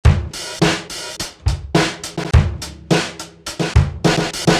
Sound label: 8 Beat var1